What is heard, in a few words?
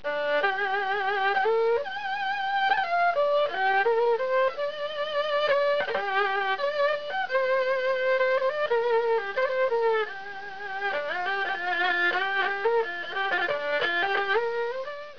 erhu